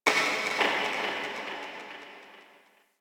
Interior Cave Rock Smash, Ambience Misc Indoor; DE; Warframe; Infested Eris

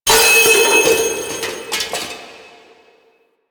Mask Knock Off; Deacon; Warframe; The New War

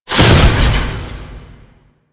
Necramech Ranged Burn Out Start; Enemies; Warframe

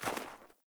Sandals Walk Snow, Footsteps; Characters; Player

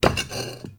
Vase Medium Impact; Objects; Vases

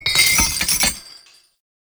Balloon Chain Detach; Encounters

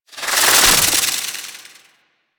Slinky Movement Rattle; Warframe; Man In The Wall

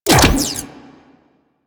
Briefcase Launcher Mech; Warframe; Weapons; Corpus

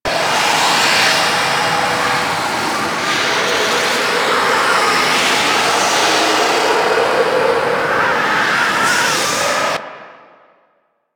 Gas Dust Loop; Ambience; Warframe; Corpus Gas City

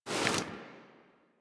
Walk Movement Foley

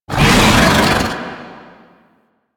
Attack Vocals; Ambience; Golden Maw; Warframe; The War Within

